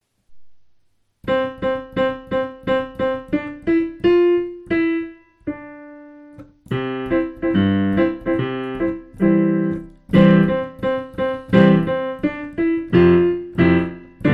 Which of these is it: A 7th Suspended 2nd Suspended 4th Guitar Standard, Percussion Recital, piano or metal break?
piano